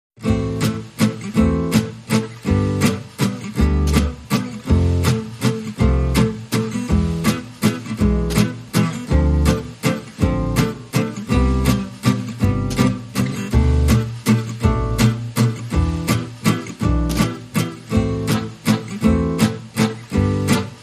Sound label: Bal Musette Waltz, No Lead Strings